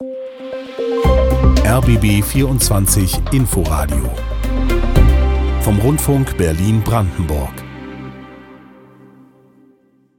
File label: Opener